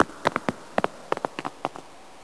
steinschlag; de scheiterhaufen2

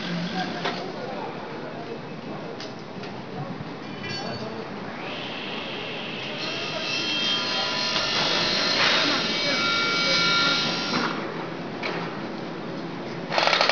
baustelle; de pandemonium

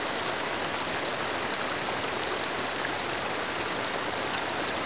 wasserrauschen; cs vicus

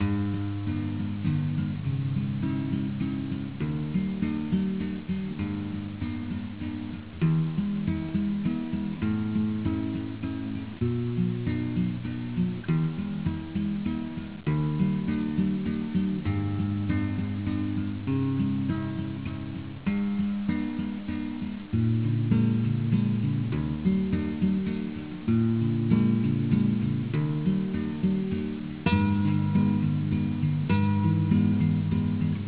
gitarre; de tartarico

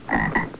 frosch; altstadt

